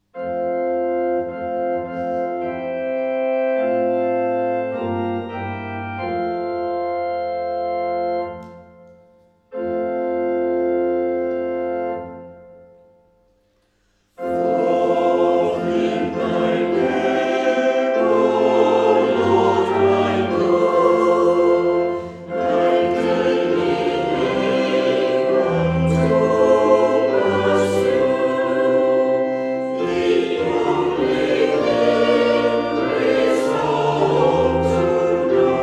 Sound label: Choir, hymns